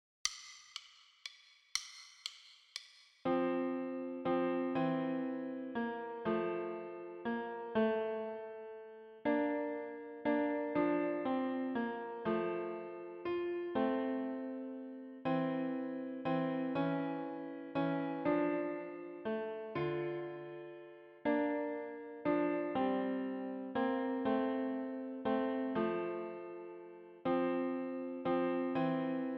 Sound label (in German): Klarinette in B Playalong; deutsches Weihnachtslied für Klarinette in B; mit Begleitung; Leise rieselt der Schnee - für Klarinette mit Begleitung